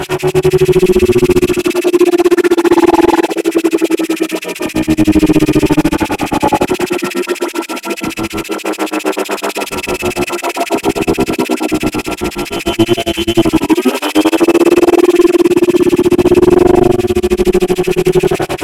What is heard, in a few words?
Gas City Projector Drone Loop; Warframe; Corpus